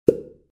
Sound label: Opening pop